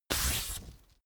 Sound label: sheet fold short